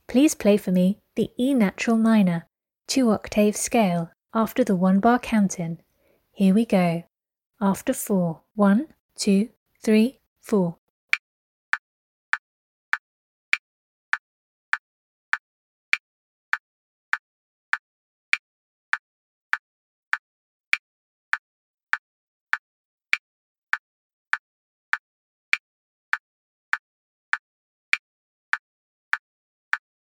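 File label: e natural minor 2 oct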